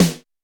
Roland U220 Dry Set

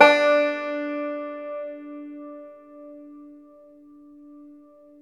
FLAT TOP, Flat Top Banjo

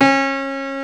STENWAY; East West; Global Sounds

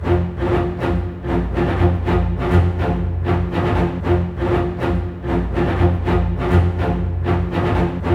120 READY, Scoring Tools ORC Ready Fight

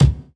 Kick, jungle breaks drum sounds

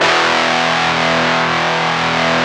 GTR FUZZ, Metal; Power Chds; Club-50 - Foundations

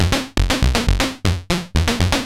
SH101 LOOPS; ELEC-LOOPS; Syntec; Wall of Sounds; TECHNO-BOX

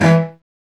STR Vc Attack; Roland L-CD702; Vc Marc&Harm

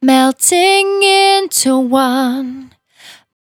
Finally 70bpm C, Velvet Vocal; Zero-G

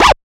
fx-percussion, Techno; Techno ID; single-drums

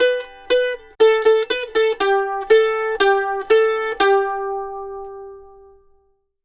Mandolin Lead; Continental Drift Aftershocks

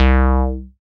SYN TBSUB 3W, SYN TB-303; Sound & Vision - Gigapack I CD 2

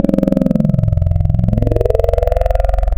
Vowel Awayee, wavetables; Waves Codex

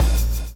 blow; Spectrasonics - Backbeat

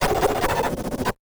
Casio, Glitched, malfunctioning synth

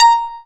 A#, Synclav FM PERCUSSION; Big Fish Audio

